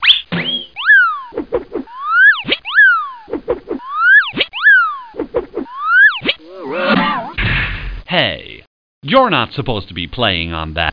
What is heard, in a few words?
slide; T.J. Finds a Friend